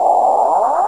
servo curious; hl2; combot

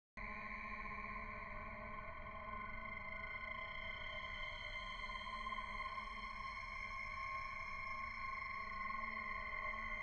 High Pitch Tone Osc Crunchy; BeautifulNoise; Intensity by Design

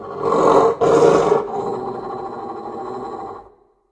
lost, antlion guard; hl2

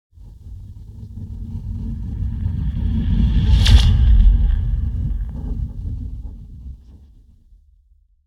sci-fi metal deformation, buckling and melting; Alexander Kopeikin; Sci-Fi Metal Elements HD

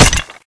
foot, cremator; hl2

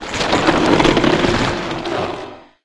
hydra send tentacle; hl2

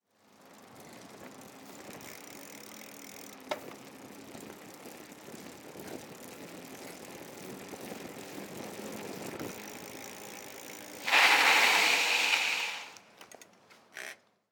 Bicycle, Riding, Skids, Drift, Concrete

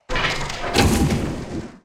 Weapon Layer Interface, Future Melee Weapons; Audio Summoners

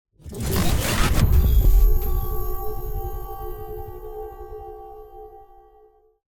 PodRacer Electronic Startup; Turbines & Engines; Fox Audio Post-Production; Space Racer 2

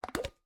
Coffee Tin Lid Open; Rogue Waves; Kawaii UI

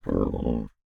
ANIMAL FARM Pig Groan, Martvili Georgia; Articulated Sounds

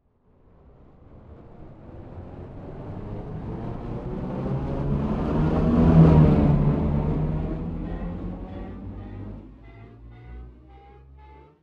Abstract Spaceship Flyby Ascending; InspectorJ; Abstract Spaceships

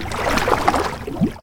water splash; impact; physics; hl2

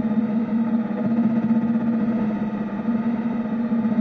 One-shot, Deep Galaxy; JC Audio

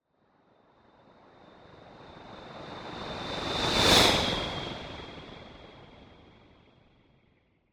Whoosh Synth Noise; Rogue Waves; Anime Studio

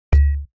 Bleeping Buttons; Digital Rain Lab

